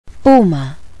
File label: puma; animals